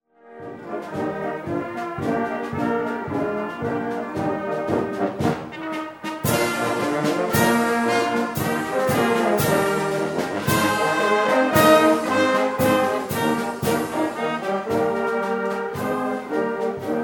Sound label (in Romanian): Fanfara